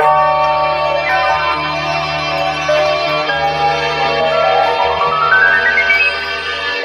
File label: keys and strings; loops; Phonky